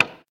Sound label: button Click; jingle spin